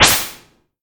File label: Jump; Pacman Jump; Pac-Man Championship Edition 2 Plus